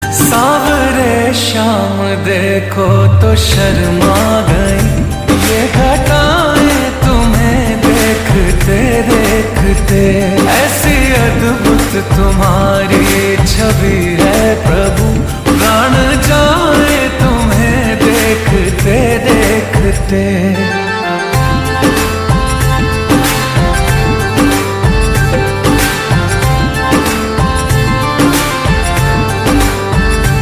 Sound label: Lofi Slowed Reverb; ringtone